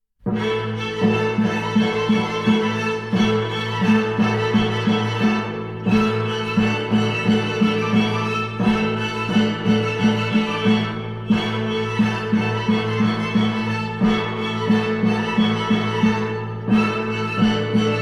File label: Square Dance